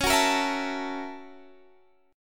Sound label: F sharp 7th Sharp 9th, C sharp